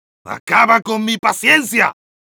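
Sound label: jeers, Spanish; Medic; "¡Acaba con mi paciencia!"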